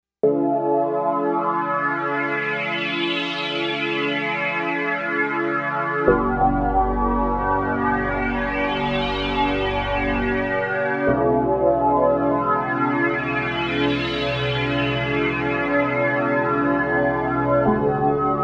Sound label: V Pad